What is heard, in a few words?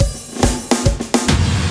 Fills, Loops 140 BPM